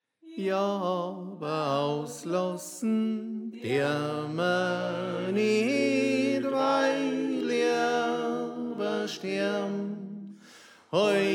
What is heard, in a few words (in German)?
Tenor